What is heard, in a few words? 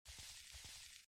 SFX tiger walk; gamerip; Out of Reach: Treasure Royale